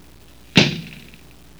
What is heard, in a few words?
SHWR CLOS